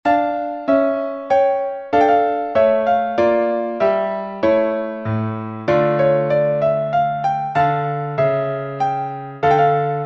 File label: menuet; visn robert